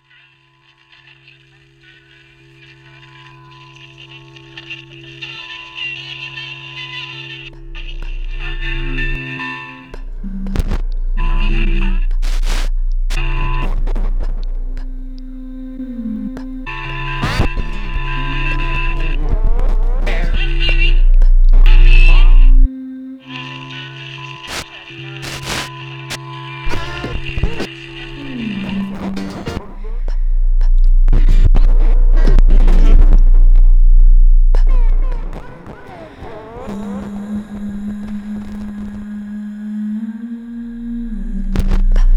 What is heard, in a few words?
breath retreat disappear